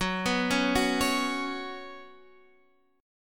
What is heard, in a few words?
Gb Suspended 4th, Guitar, Standard; Gbsus4; arpeggiated; 14,14,11,11,14,x; down; capo=3